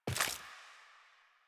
Steps

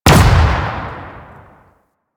Note Projectile Hit; Warframe; Bard Quest; Bard Boss Fight

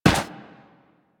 Walk Dirt, Footsteps; Warframe; Excalibur

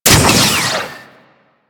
Heat Gun Fire; Grineer

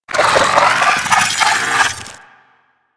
Amalgam Moa Satyr Vocal Idle; Warframe

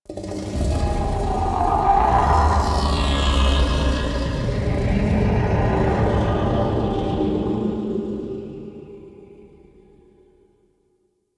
Growly Vocal; Ambience; Man In The Wall

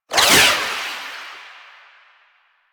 Parazon Whoosh; Kuva Lich Finisher; Warframe; Finishers

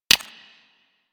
Console Hack Button Press; Warframe

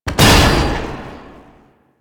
Tank Swing Attack Impact; Enemies; Abilities; Warframe; Lasrian

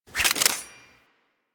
Throwing Dagger Reload; Warframe; Weapons

